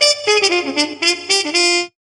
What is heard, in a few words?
horn and cough, Loops, Phonky